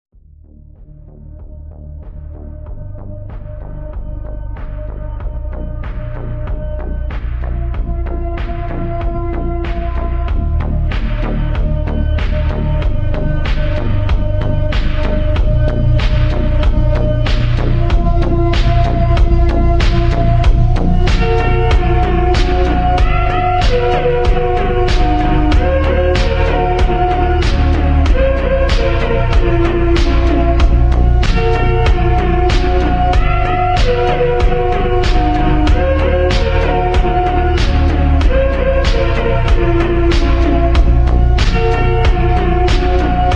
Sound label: instrumental slowed reverb